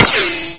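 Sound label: ricochet; weapons; svencoop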